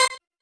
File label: normal hit normal